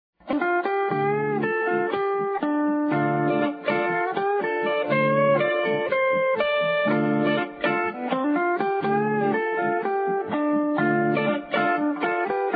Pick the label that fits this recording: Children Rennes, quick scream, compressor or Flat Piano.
compressor